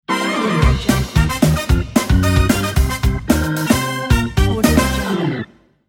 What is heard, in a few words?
funky horns bumper ad